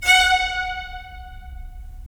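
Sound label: strings violins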